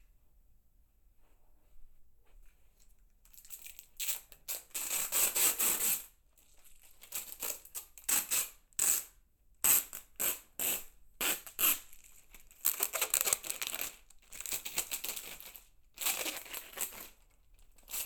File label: Scotch Tape, binaural